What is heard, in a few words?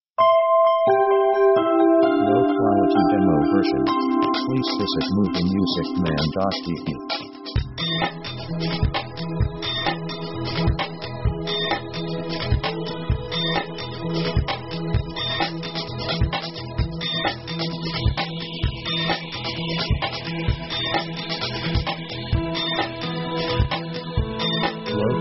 liquid background